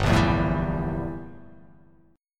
G Minor 6th Add 9th G down

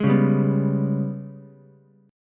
G sharp Minor Major 7th Double Flat 5th, Ukulele; strummed; JGuitar

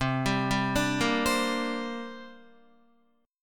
C 7th Suspended 2nd C down Guitar; C7sus2 arpeggiated

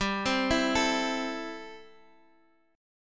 A Minor 7th G

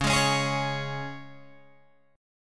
Db 5th, Guitar, Open G

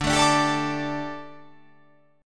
D Suspended 4th D, down

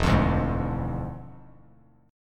E 7th Flat 9th, Bass Standard, E7b9 chord; strummed

